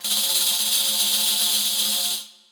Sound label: Orch perc; musicradar; cartoon caper